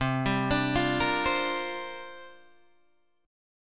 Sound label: C 7th Suspended 2nd Suspended 4th C down Guitar Open G